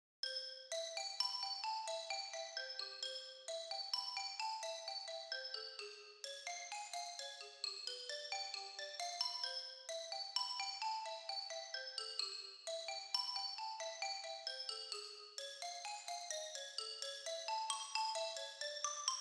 xylo and celesta; Regular VSL

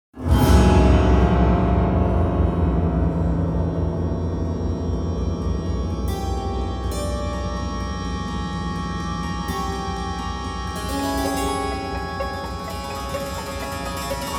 keyboards and organ

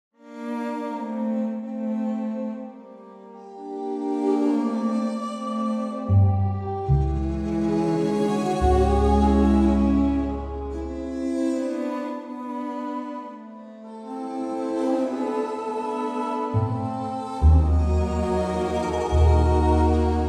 Chamber II solo sourdino; Dietz

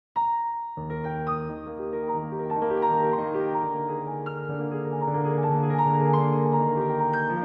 Etude in Eb major

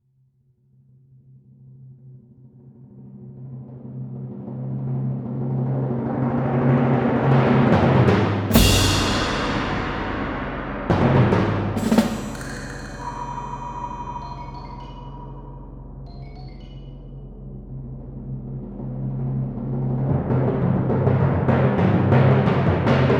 perc; Synchron

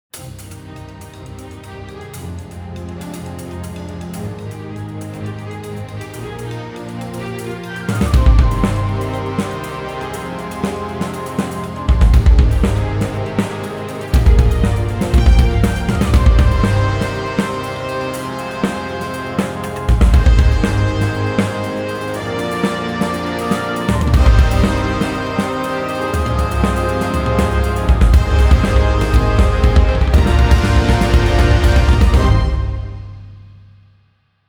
Power Drums

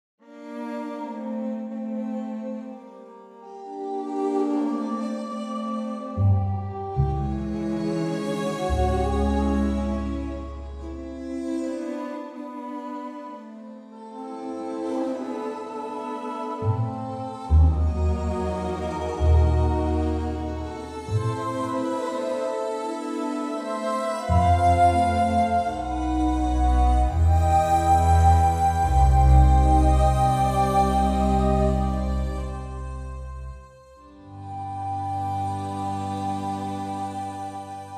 Chamber solo sourdino

